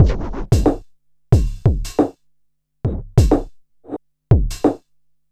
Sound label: Street Tip, R&B Drums